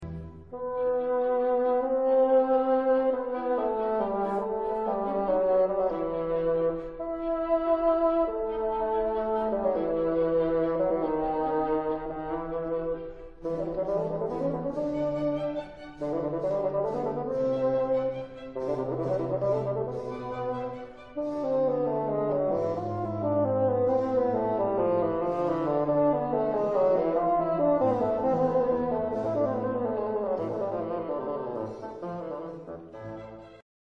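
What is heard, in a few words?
bassoon; fagote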